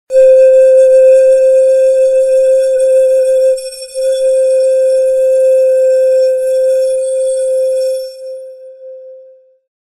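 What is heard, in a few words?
bambukovaya fleita, bamboo flute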